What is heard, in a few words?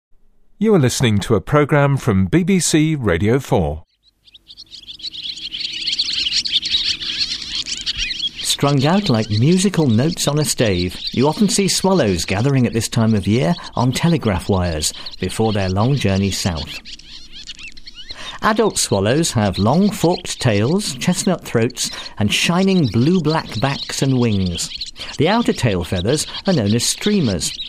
swallow